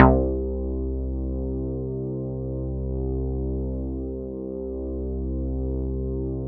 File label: bass, synths